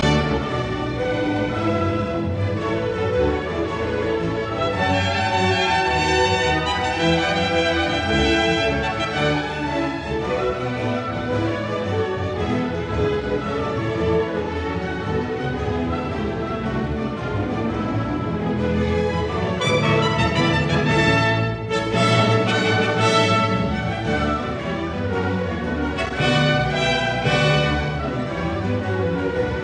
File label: Overture Suite in D major Gigue; Bach; Otto Klemperer